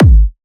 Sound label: Techno, Kick; Techno Sounds 2024